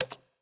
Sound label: button Click; americanroulette3